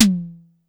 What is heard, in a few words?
Drum X Tom; Pearl Drum-X